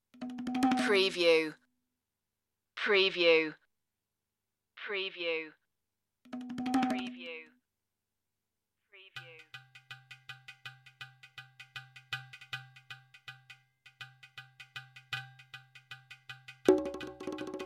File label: ethno scat